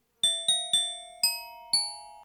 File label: Hovering owl